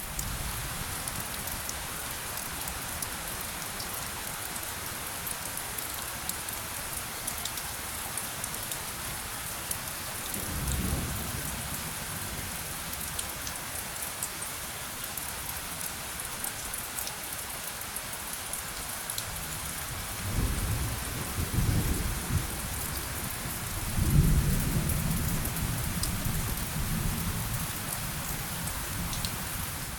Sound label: STORM Heavy rain falling; Elevenlabs